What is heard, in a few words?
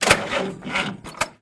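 car starter sounds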